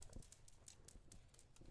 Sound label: Dog Walk Concrete